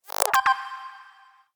success

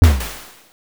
ally earth attack

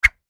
hover

